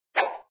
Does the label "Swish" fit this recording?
Yes